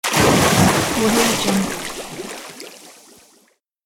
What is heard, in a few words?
water splash jumping in